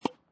Tennis Hit Ball with Racket Distant; Sports

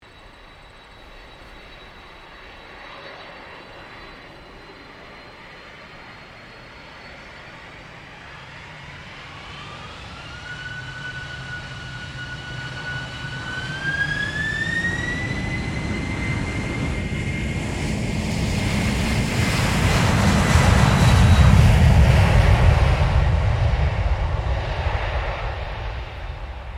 1995 A320 AIRBUS TAKEOFF; Airplanes; Vehicles

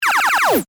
Laser Machine Gun, Fire, Shot, Science, Fiction; Scifi

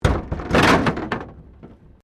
Wreck Door Panel Clunk Metal; Wrecked Cars, impacts, crashes, scrapes; Objects

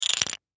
Dominos Small Stack Push Over; Board Game; Board Games (Sound Effects:Games)

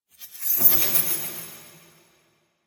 Magic Illusion; Magic Sound Effects

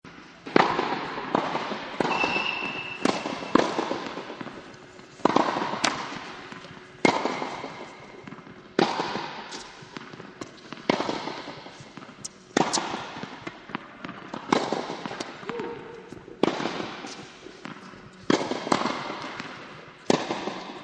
Tennis Courts; Sports; Partners In Rhyme

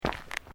Footsteps Sneakers Road Step; Footstep Foley; Human

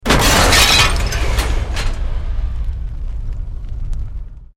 Explosion Medium Metal Glass; Created explosion effects, house, building or vehicle effects; Bomb Sound Effects

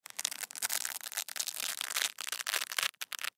Velcro Rip, Velcro Pull Apart Slow Long Rip; Ripping Sound Effects; Foley; Objects; Blastwave

